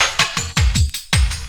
Loop, Drum & Bass, 160 BPM

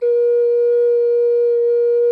ALT, GEMSHORN; SoundBytes - Early Patches

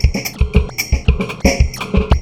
108 LATIN; USB Soundscan vol.20; Fresh Disco House I; AKAI; DISCO ADD

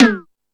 Hip House, Drum Machines E-MU Pro-Cussion

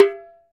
Tamborim Open, Percussion; Roland BK-5

